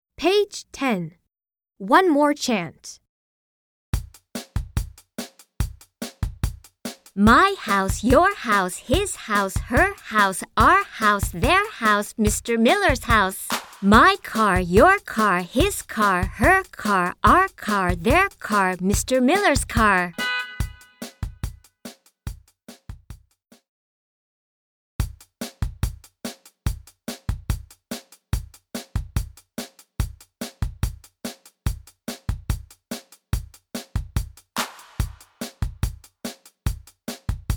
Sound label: One more chant